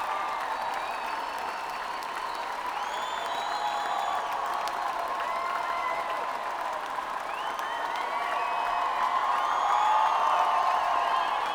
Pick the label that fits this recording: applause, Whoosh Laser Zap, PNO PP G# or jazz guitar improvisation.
applause